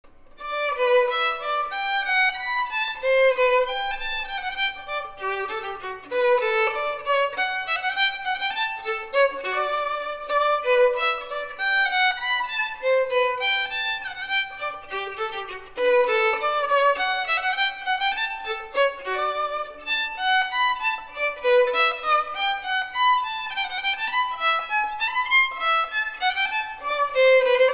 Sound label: Bourree, violinist